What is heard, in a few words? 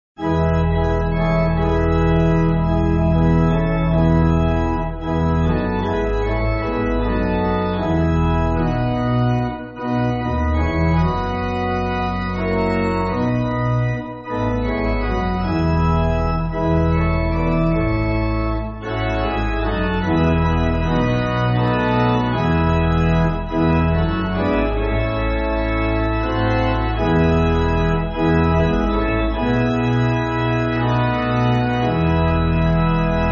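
Pipe Organ, church backing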